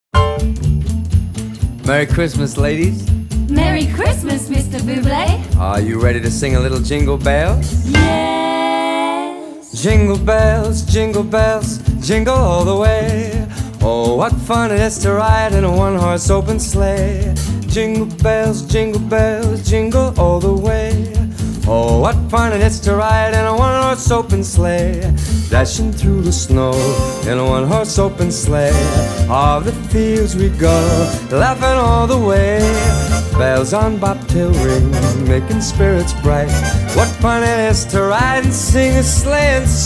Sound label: jingle bells; junior ls